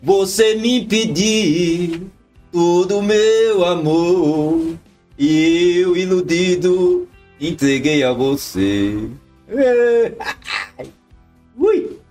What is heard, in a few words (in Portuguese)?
voce me pediu todo meu amor cantando